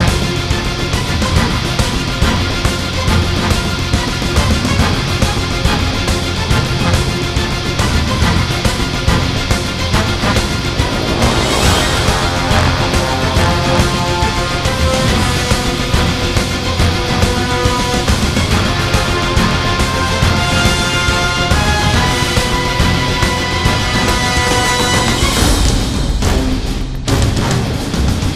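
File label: full band long loop; transformers war for cybertron gamerip; IACON IN RUINS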